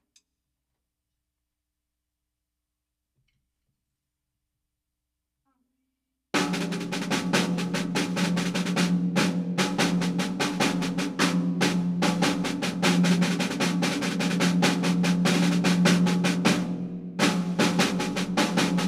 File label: flamacue quickstep